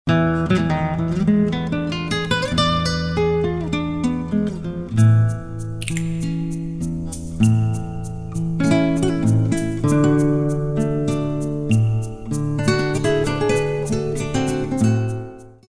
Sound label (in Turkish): santral fon muzikleri